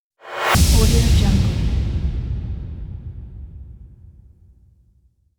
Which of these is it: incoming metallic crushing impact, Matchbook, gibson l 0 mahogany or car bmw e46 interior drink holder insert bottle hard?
incoming metallic crushing impact